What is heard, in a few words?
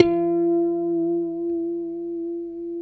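F#4 TAP harmonic, guitar; Spectrasonics; Hans Zimmer Guitars VOL-1; JC-TAPONICS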